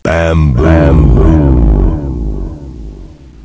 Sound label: bamboo